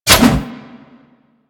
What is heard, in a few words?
Stalker Scythe Spin Whoosh; Warframe